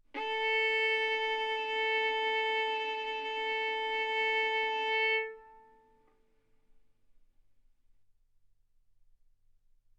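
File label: Violin arco mf A string A4 B4 stereo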